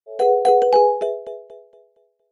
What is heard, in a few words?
consequence, notification sounds